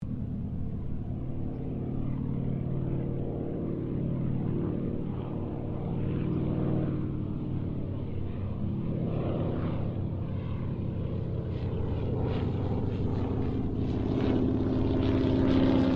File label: Harvard squadron 4 pass, stereo